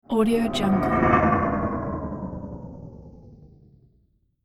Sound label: vacuum whoosh